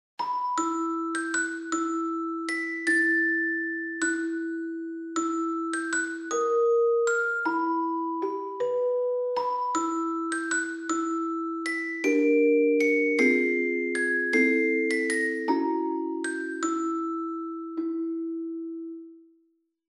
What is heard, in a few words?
celesta